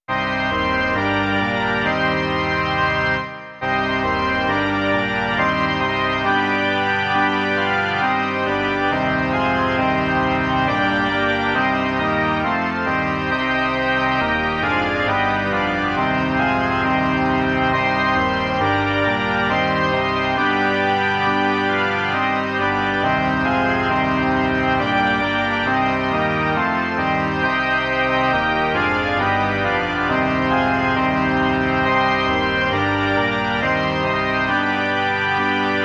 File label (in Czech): varhany; Dobrý Spasiteli náš